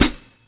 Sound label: Bon Bon Stomp Hi-Hat; KJ Sawka; Drum n Bass & Beyond; Drum Tool Box